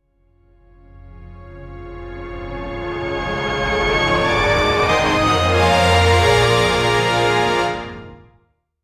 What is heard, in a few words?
uplifting orchestral rise